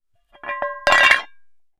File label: Metal; TOOLKIT vol.2